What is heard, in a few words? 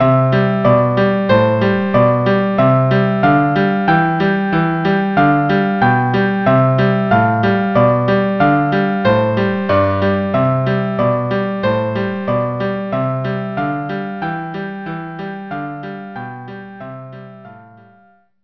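violin cello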